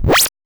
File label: heal; blue fire